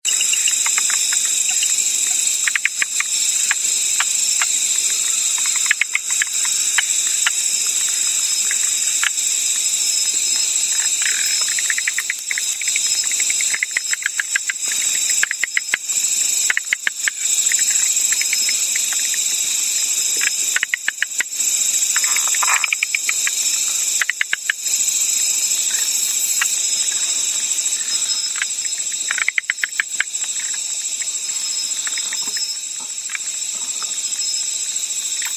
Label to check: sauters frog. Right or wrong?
Right